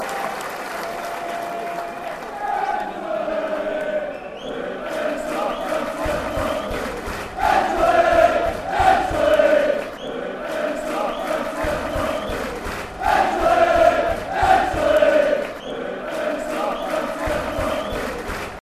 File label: wembley wembley; scfc